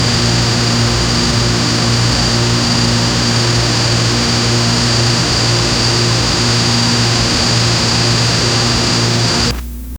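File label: cassette click lo fi static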